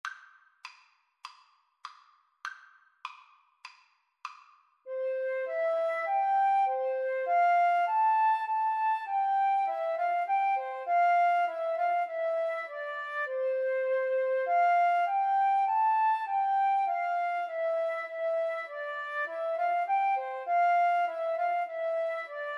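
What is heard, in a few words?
flute violin karaoke; Violin-Flute Duet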